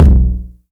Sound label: Tom 909; One Shots; Drums